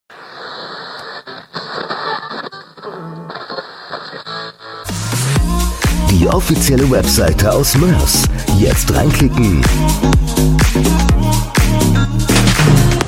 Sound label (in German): jingle website kurz